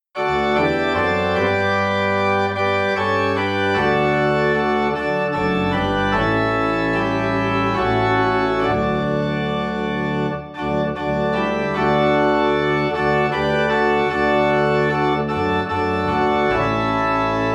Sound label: Organ in D; The Love of God